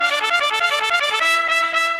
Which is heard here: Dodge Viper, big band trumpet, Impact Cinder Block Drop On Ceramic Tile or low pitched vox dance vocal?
big band trumpet